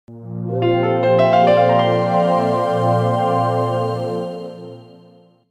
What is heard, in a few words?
Boop; Wii Fit